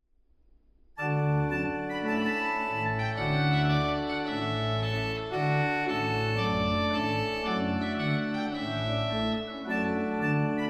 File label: Trumpet tune; J. Clarke; mostoles